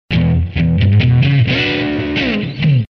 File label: E 90 rhythm blues